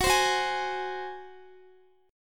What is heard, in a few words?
F sharp Suspended 2nd Flat 5th, Guitar; F#sus2b5 strummed; 4F,4A,5C,5G,5C,6E; chord shape; x,x,18,17,18,16